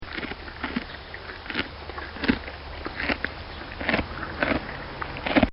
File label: A Horse Eating Hay; Horses; Animals; Bobby Cole